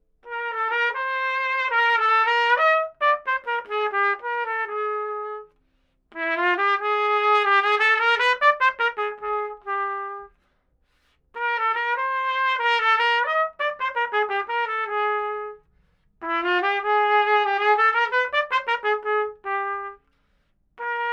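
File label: domra, Neumann TLM170; truba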